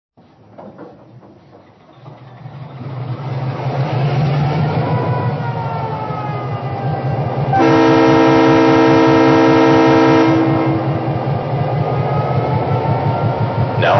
EMD F3

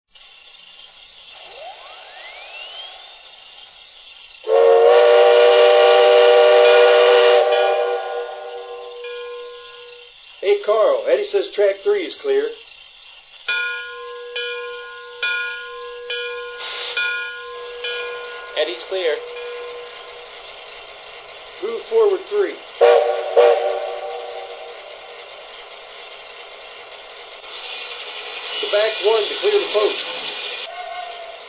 climax

